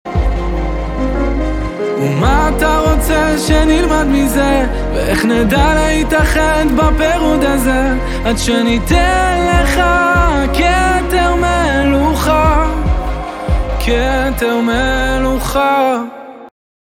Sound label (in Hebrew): צלצול